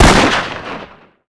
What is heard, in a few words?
Weapons Gun